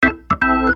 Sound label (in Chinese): 风琴